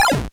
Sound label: sound neutral; lows adventures